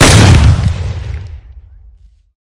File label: gun